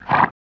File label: Horse Snort Curt